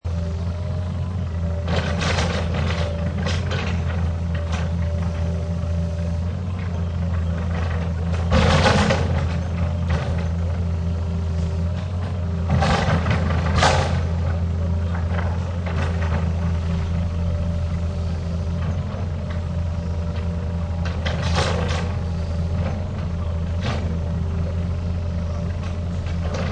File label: Digger, a powerful construction digger tearing apart the ea; High quality stereo recording of a powerful construction digger tearing apart the earth; Digging